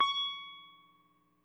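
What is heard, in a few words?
electric sitar soft; Quantum Leap - Guitar & Bass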